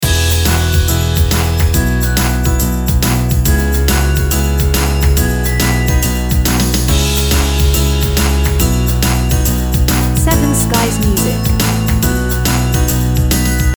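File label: Instrumental loop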